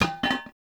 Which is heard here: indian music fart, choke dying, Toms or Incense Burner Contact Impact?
Incense Burner Contact Impact